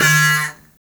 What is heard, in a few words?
BUZZ XYLO D#4; Spectrasonics; Heart of Africa 1